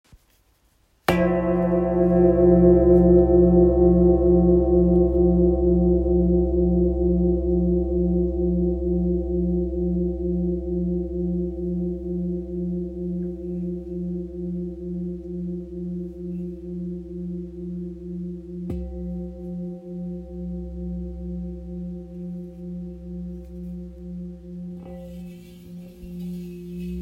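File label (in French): Ramgandi fa, bols chantants tibétains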